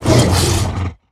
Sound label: Enter Play